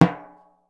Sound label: TIMBALE L, LATIN percussion; Voice Crystal - Steve Reid Definitive Percussion; LATIN SET